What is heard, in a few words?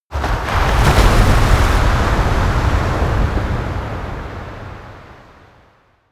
coast oneshot surf strong